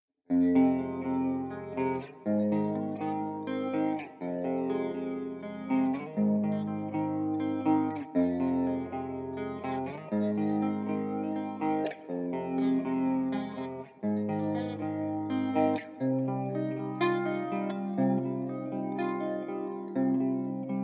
Guitar

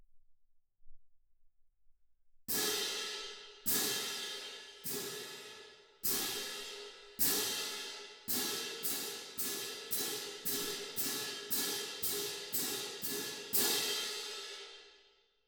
Twin R Perc

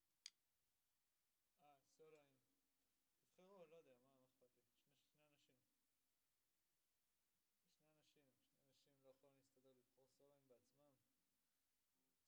SNR

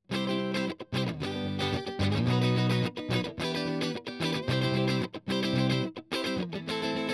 Strum

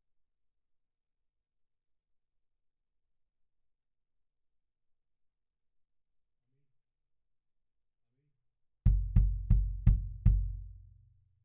Kick In

